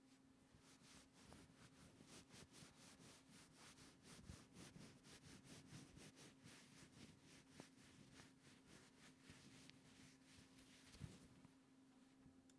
kinuzure; ASMR

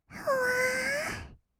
ふわー; 色々なワンショット; 1200 kawaii voice!!

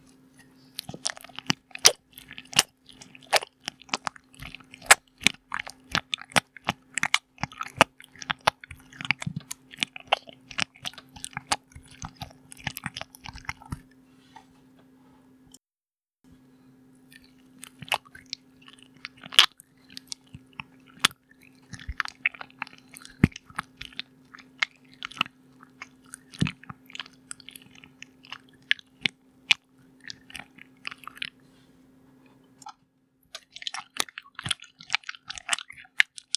ぐっちゅ; ASMR

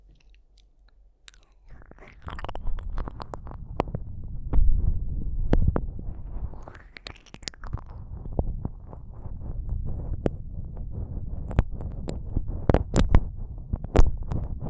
舌を奥まで入れる耳舐め, ASMR

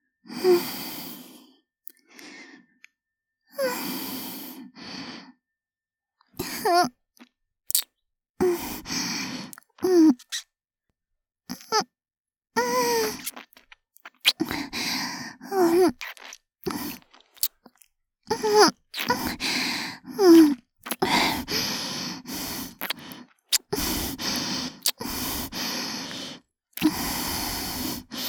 受け身, ASMR キス

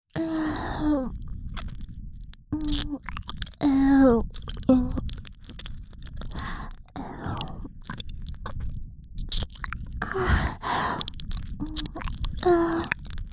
しゅきしゅき囁き右耳舐めループ 耳塞ぎ; 耳塞ぎ舐めver

